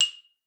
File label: F6, xylophone; Xsample VOL-4